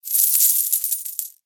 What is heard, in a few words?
roulette sound end